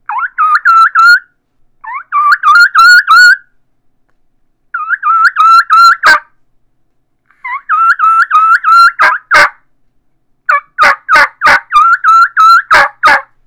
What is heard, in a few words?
keekees keekee runs, turkey; batwing IV; Southland Sounds